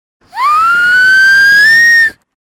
zvuk svista

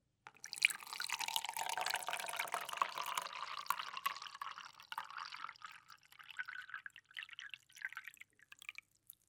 zvuk nalivanija vody v stakan

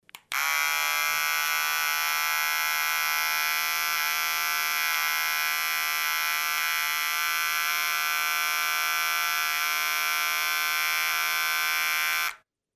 zvuk mashinki dlja strizhki

